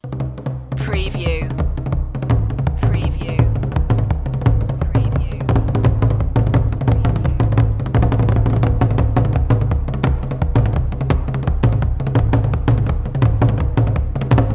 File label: african drums